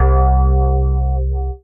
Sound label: Tube Piano Bass; Synth